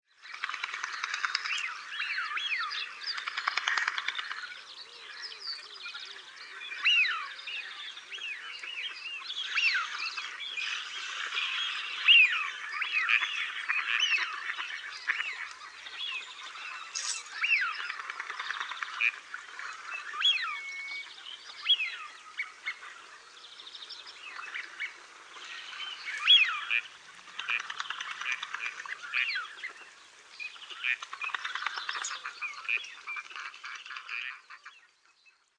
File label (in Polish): swistun, birdsounds